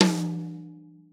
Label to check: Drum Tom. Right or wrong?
Right